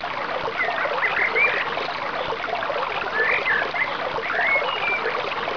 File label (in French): eau; tropic; water